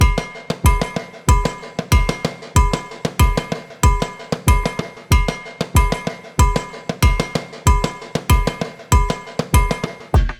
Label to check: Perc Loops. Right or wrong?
Right